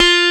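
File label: F3 Telecaster, guitar; AKAI S-Series CD-ROM Sound Library VOL-8; SET#1 GUITAR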